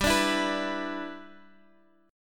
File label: G 6th